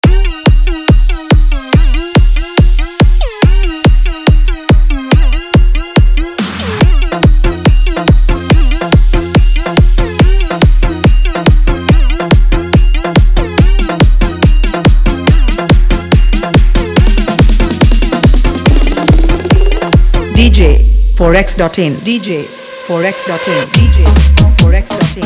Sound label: new beat dj competition song, hard vibration